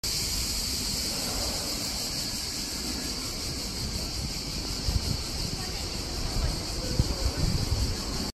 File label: cicadas